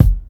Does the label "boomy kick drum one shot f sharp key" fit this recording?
Yes